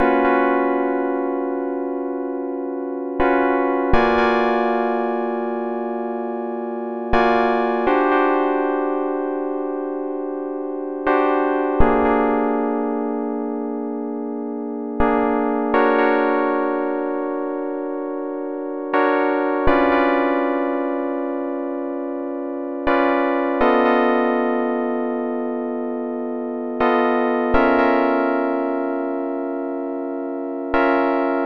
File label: 122 BPM Tonic F Fmaj Dmin Chord Progress; mmb chord progressions essentials